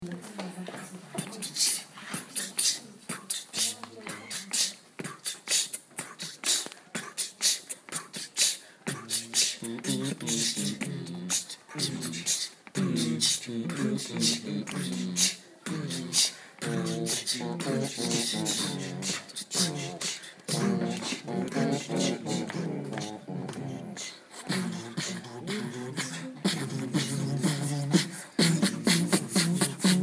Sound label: Beatbox Impro Groupe